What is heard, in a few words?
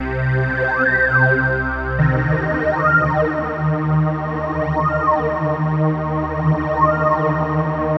Med Pad; Strings & Pads; Sonic Foundry (Sony Creative Software); The Electronic Music Manuscript Collection